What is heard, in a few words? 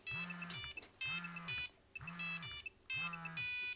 nokia 5510 ringtones; kick